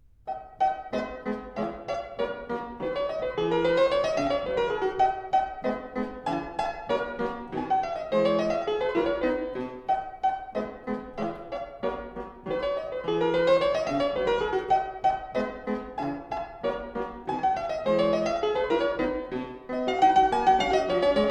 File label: Piano Sonata in C Rondo Allegro; No.16; KV.545; Sonata facile